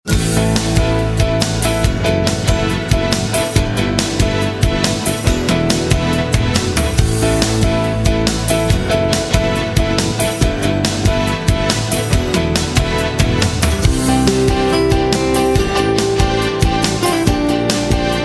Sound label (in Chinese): 适合轻快